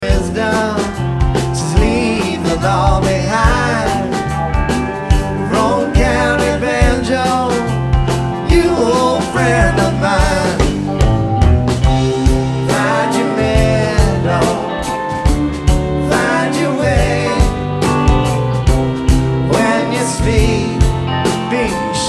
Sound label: Banjo